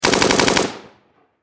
Warfare Machine Gun 7.62 Caliber Bren Lmg Long Burst Distant Perspective; SFX Bible 6